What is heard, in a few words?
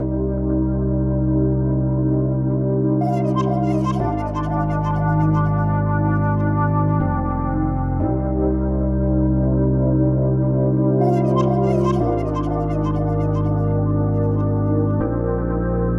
Loop